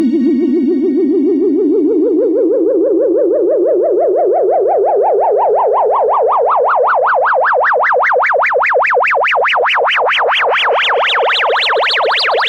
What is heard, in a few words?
Drill